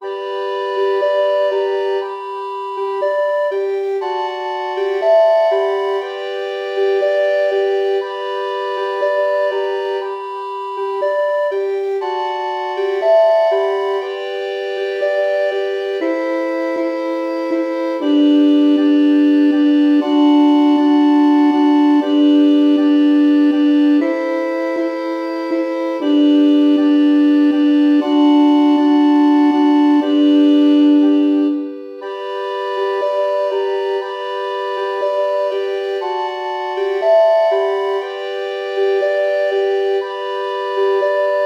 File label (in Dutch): zonder sopraan